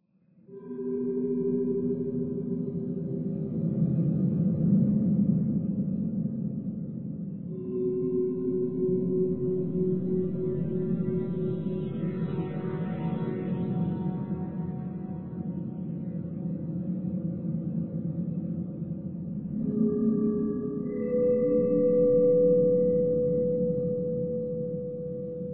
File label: drone